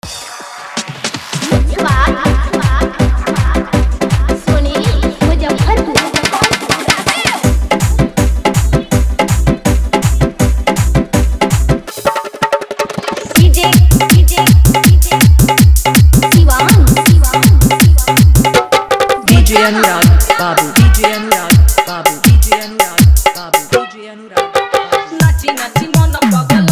Fully Dhollki Bass Rod Dance Mix

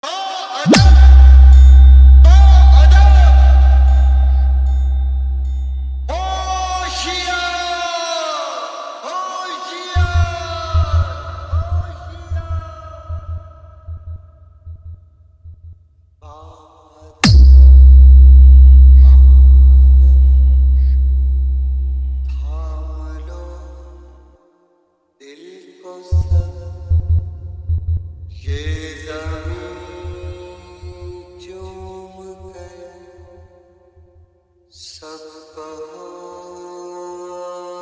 Old is Gold Full Desh Bhakti Dance Mix

